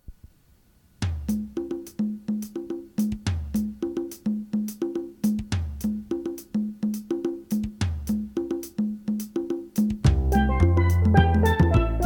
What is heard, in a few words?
Steel Drum